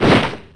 shoomp

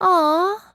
awww; mahiru

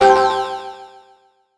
matrix mad sad shock

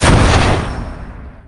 bazooka shoot; iris watson

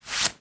pull cloth

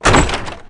door opens

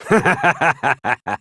laugh

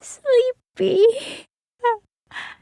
sleepy

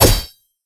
slash

